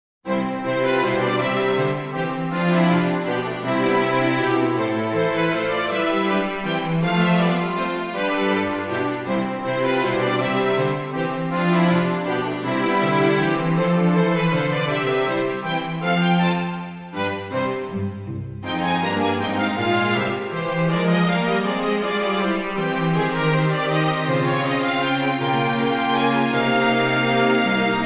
Old-Fashioned Waltz; Elysion; Music Diary from St. Maria

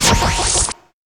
Babarian Thirst of Blood; Inotia 3

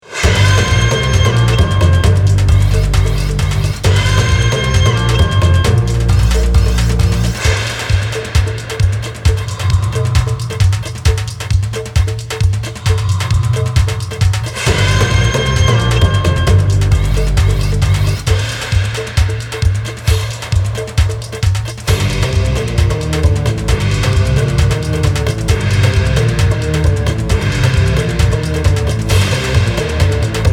Character Ending; primal rage 2; arcade prototype

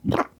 Panel Toilet Plunge, sound effects; Among Us

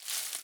Panel Storage Plant finish; Among Us

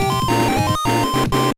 Jingle, gba; Pinobee & Phoebee

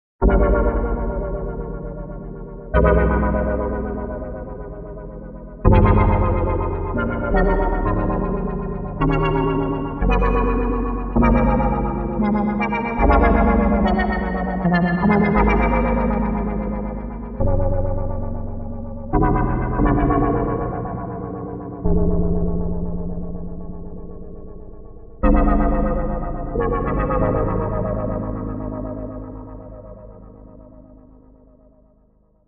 Piano Temple; hylics gamerip pc 2015; sage room

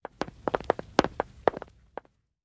Footsteps Wood Group Enter; The 39 Steps

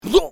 Pig hit; bad piggies; android; mobile; windows

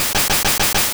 Smoke Screen

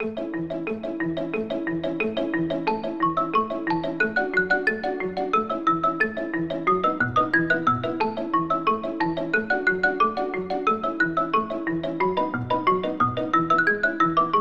Upbeat Theme

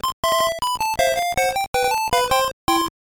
Jingle; Higashio Osamu Kanshu Pro Yakyuu Stadium '92; GB

